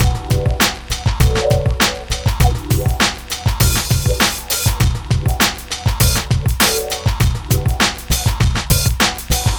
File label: breakbeats